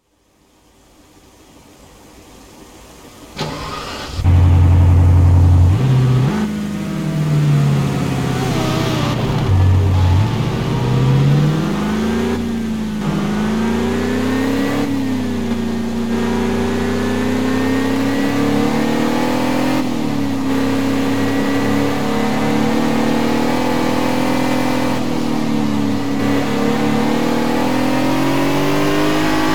spyker c8 spyder; TDU-1

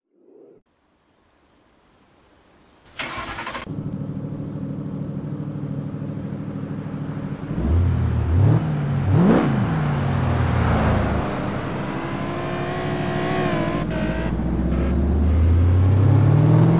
ford mustang gt

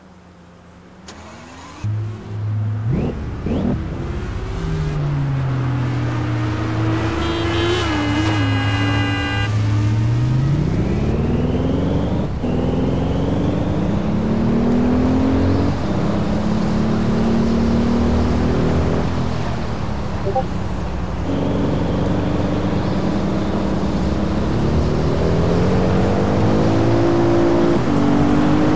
volkswagen w12

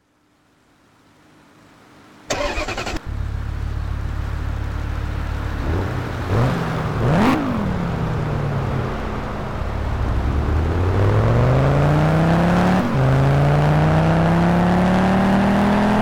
ford focus rs; tdu

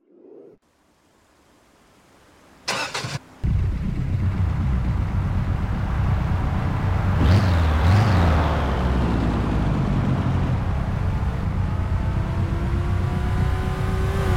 ford mustang gt-r concept

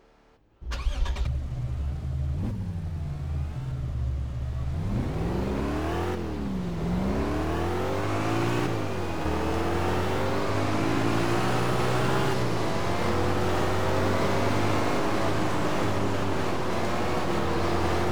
lexus ls 460 l; TDU-1

